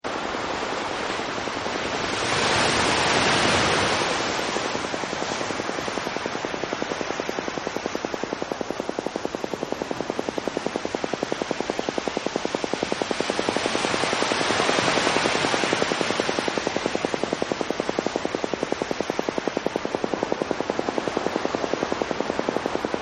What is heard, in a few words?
ocean